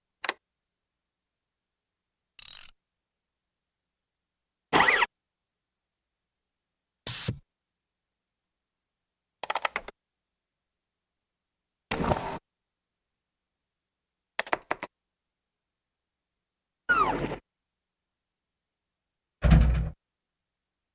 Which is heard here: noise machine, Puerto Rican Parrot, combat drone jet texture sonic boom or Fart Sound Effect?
noise machine